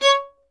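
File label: STR violin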